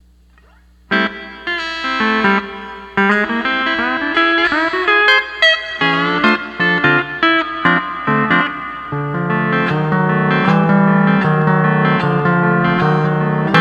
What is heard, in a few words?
12 String Lap